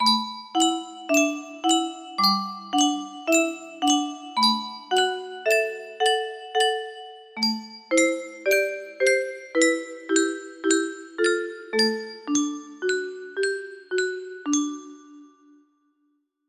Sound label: melody